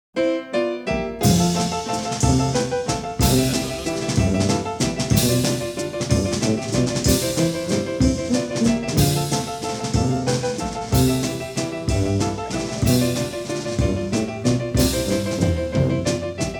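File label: Jazz Ensemble, Waltz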